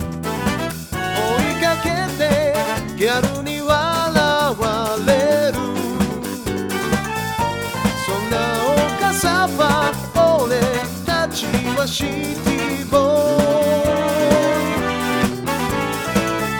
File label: brass distance designer dry; audiobrain